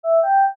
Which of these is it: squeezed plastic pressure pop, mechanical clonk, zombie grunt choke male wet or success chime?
success chime